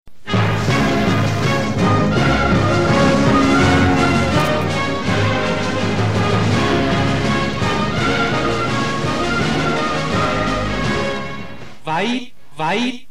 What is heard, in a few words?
jingle